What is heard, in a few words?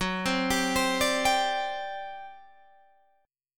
G Major 7th Suspended 4th, Gb